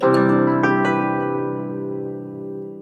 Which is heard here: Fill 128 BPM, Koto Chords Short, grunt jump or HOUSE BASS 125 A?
Koto Chords Short